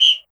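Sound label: PERCUSSION, 150 BPM; Ueberschall; Drum & Bass Flash Trackz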